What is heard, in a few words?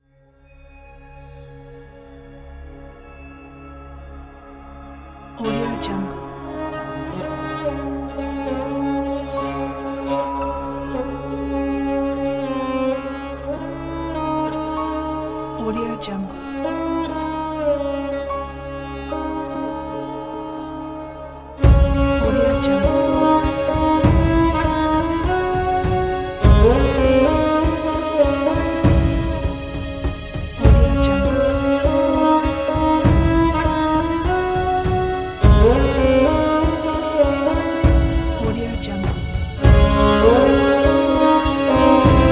ethnic revolution; ereneralp